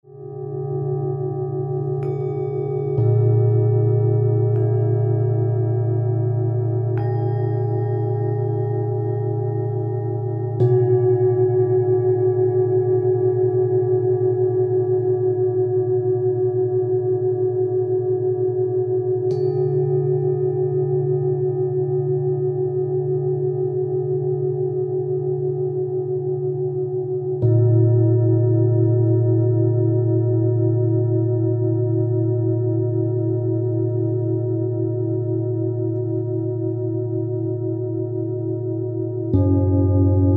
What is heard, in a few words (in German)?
Klangschalen Meditationen